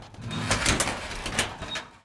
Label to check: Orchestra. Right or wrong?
Wrong